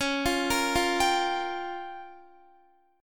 Db 6th Flat 5th, Guitar; Db6b5 arpeggiated; JGuitar

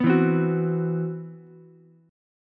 C Major Sharp 11th, Ukulele C6; CM#11 strummed; JGuitar

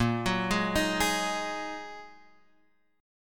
A sharp 7th Suspended 4th Sharp 5th A sharp; arpeggiated; x,1,4,1,4,4; chord; JGuitar

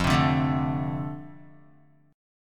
F 6th F down Guitar, chord; strummed; JGuitar

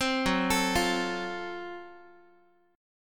Gb Minor Major 7th Flat 5th Gb down Guitar; arpeggiated; 15,14,12,15,x,x; JGuitar

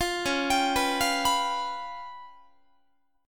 Db 7th Flat 5th Db, down, Guitar; Db7b5; arpeggiated; tuning=4A,4E,5A,5D,5G#,6C#

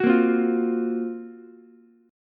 Gb Major 7th Flat 5th Ukulele; GbM7b5 strummed; JGuitar

